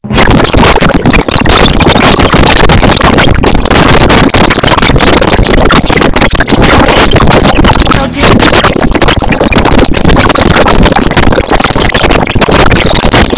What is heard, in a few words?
music, GSM 11 Khz, mono